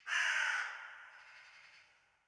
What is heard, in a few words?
ambient winter birds